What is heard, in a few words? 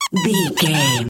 voice fun creature squirrel